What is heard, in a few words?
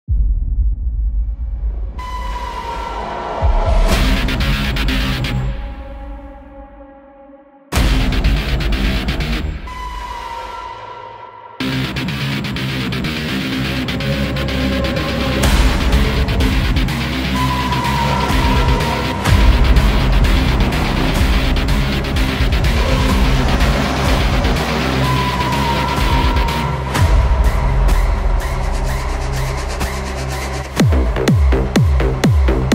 dark techno ebm cyberpunk industrial beat